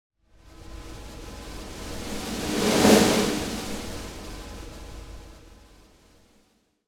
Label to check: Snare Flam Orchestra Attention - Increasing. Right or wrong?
Right